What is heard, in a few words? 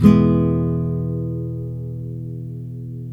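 NYLON E MAJOR DOWN, CHORDS, Guitars; Spectrasonics; Hans Zimmer Guitars VOL-1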